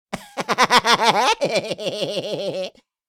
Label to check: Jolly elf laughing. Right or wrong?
Right